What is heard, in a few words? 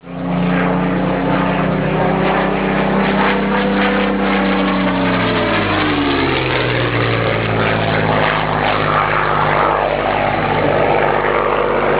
Spitfire